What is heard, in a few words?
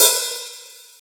ambient open high hat c key, Royality free open hi hat tuned to the C note